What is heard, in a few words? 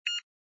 scan